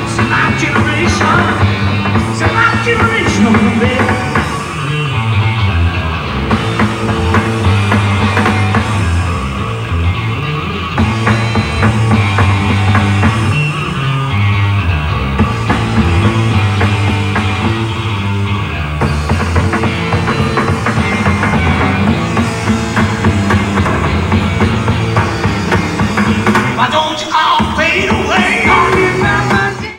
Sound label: Bass Solo